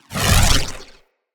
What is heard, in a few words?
Voltaic Sword Projectile Launch; Characters; Enemy; Abilities; Sinecure; Banneret